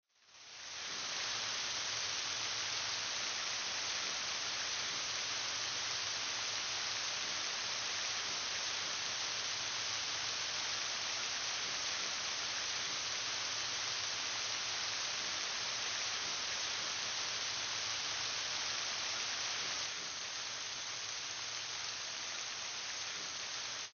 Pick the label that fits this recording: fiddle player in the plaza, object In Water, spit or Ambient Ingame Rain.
Ambient Ingame Rain